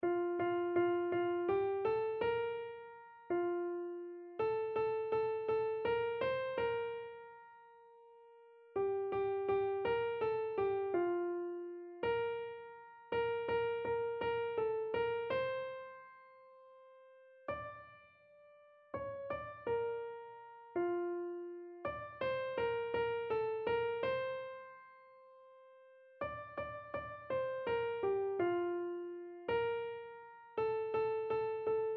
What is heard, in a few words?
showers of blessing, keyboard